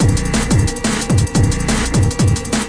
intelligent hardcore, drum loops and breaks, 178 bpm